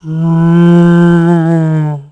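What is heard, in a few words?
zombi attack; GammaAge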